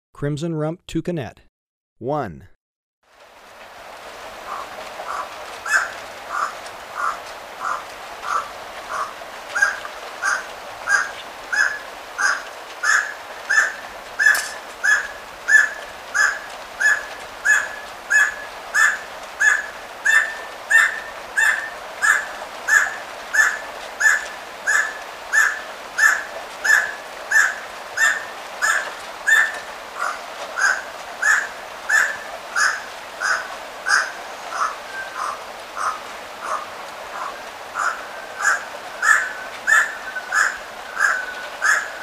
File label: Crimson-rumped Toucanet, Birds of Ecuador; S. America